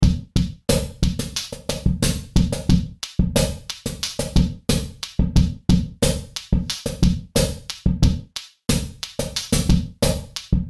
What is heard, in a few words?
Beats and Effects